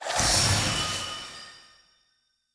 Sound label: Colour Palette Pickup